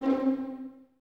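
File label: Violins C4 pizzicato, String Ensembles; Miroslav Vitous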